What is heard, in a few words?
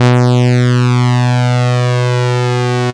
Raw Synth; instrumente